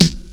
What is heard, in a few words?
clear snare sound E key; snare drum samples